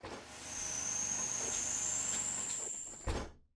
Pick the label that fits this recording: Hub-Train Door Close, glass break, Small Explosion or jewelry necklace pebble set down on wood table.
Hub-Train Door Close